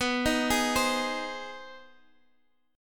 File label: C Minor Major 7th Sharp 5th, B, chord